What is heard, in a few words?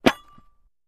Axe Falls To Ground, Dull; Axe Fall On Ground With Dull Thud And Light Bounce. - Light Metal Thuds